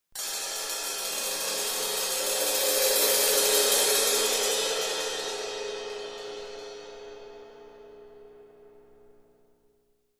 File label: Cymbal Two Crescendo